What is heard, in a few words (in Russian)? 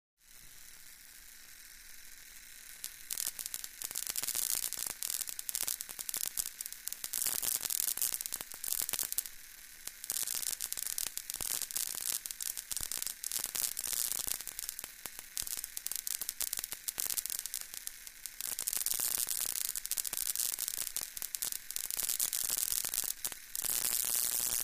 katushka tesla